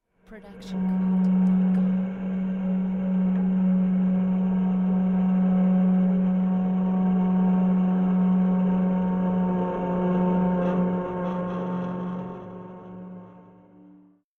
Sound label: Distorted Bowing; SoundsCrate